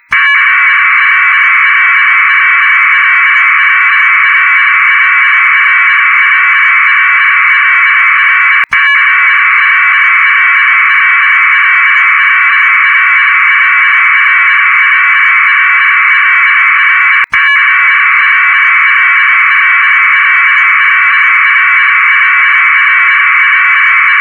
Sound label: 25 Packets Preemphasized Audio, Packet Radio